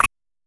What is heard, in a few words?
the sound of a rubber, rubber duck